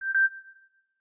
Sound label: beep beep; samsung; android